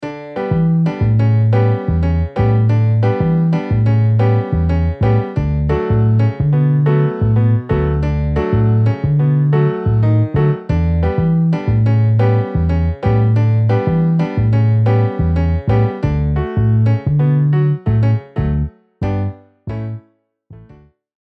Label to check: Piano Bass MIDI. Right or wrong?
Right